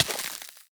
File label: freeze hurt; cauldron frozen lava pack